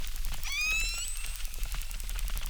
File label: Chirp, acquarium